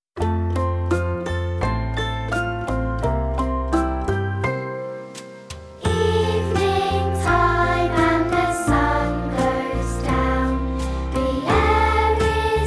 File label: Vocal